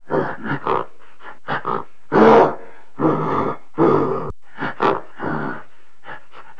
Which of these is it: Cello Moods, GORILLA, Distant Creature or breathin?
GORILLA